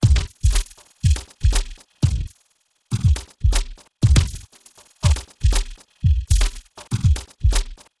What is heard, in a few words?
Beat, Drums, Eclectic Electronica